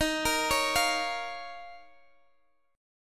Eb 7th Suspended 2nd Eb down Guitar Standard; arpeggiated; chord

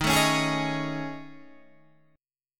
A Major 7th Flat 5th

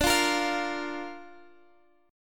D Minor Sharp 5th D Guitar Half Step Down

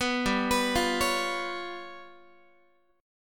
G 7th Flat 5th, Guitar; G7b5 arpeggiated

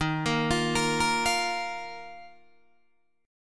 D sharp Suspended 2nd D sharp, Guitar Open D; chord shape

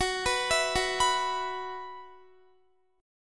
Gb 7th Suspended 4th Gb down Guitar; Gb7sus4 arpeggiated

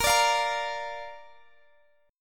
A sharp Major, down, Guitar, DADGAD

